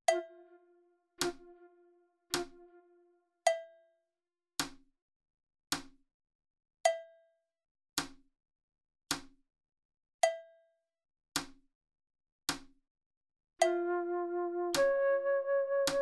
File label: Flute Medium; Uebungs-CDs; Playbacks